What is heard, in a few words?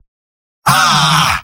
spy pain severe; vo; tf2; mvm